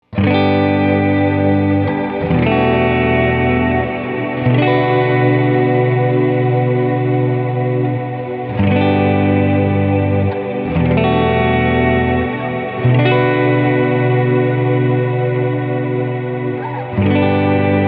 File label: cloud; BigSky; reverb; Lift Trails